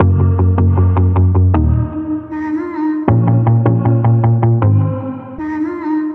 NO NAME BPM 156

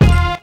Hit

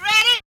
Chant

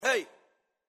turn it up acapella

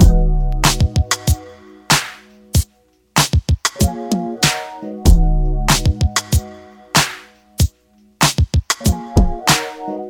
LOOP

